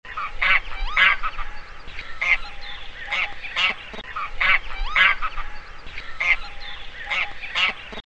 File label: Anser anser